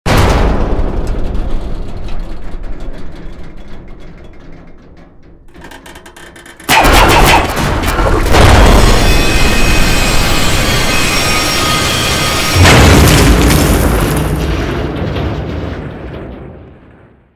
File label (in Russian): zvuk padajuschego lifta